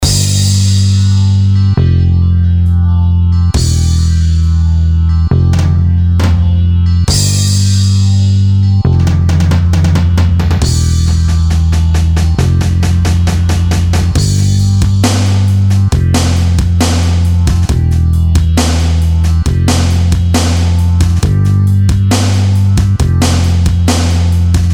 Rock Taste, Akai MPC1000

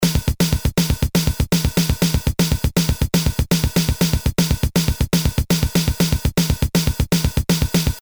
Fill-in 8 Beat, Boss DR-220A Preset Patterns

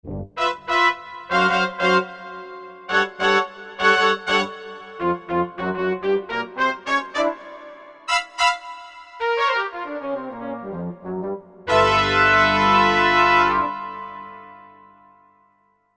Can. Brass, Korg MSC-11S Brass

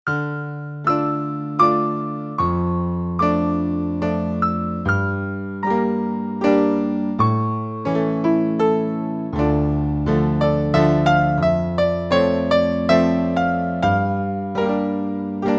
Mellow Piano; Factory Patches

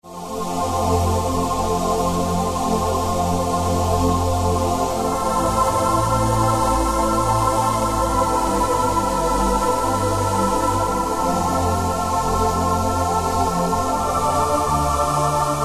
Yamaha TG500, CH Aah

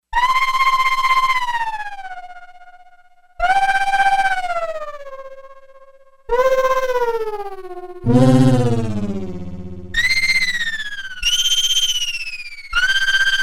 Yamaha DX11 Terror!; Factory Preset Voices

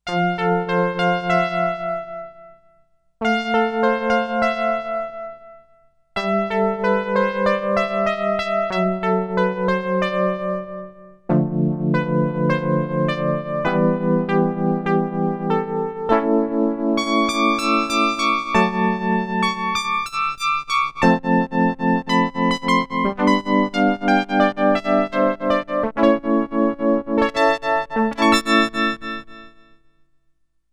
Plucky, Sequential Circuits Six-Trak; Factory Programs

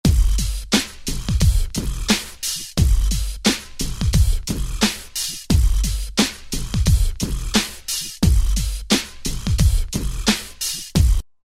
Hip Hop; 88 Phat Boyz; Roland SR-JV80-12 Hip Hop Collection

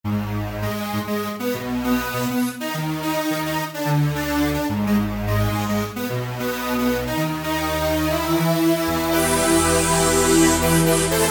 Rave Rip, Generalmusic Equinox

